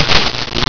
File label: Lay TNT; Miner Max